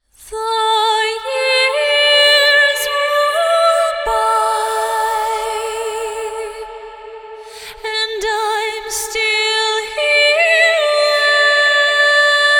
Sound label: Top Line Vocal - Fm 60Bpm (WET); Construction Kits; VOCALS; Ghosthack; SkyRealm; Kit 04 - High Rock View